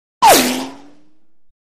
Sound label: Bullet Ricochet Off D